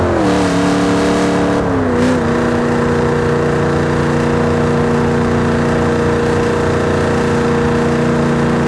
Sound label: fourth cruise, vehicles